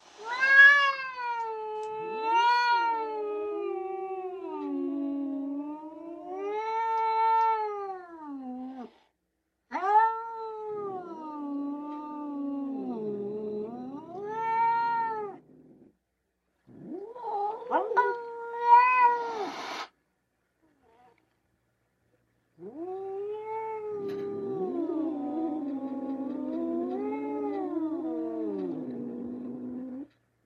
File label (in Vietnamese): 2 con mèo sắp xếp mọi thứ meo và rít